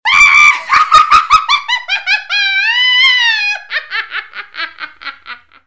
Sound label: evil laught